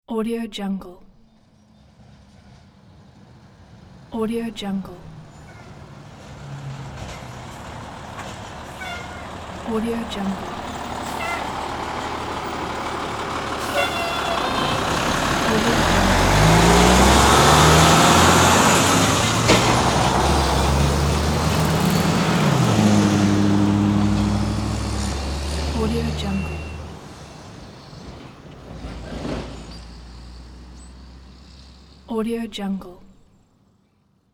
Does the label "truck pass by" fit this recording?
Yes